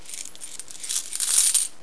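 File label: money coins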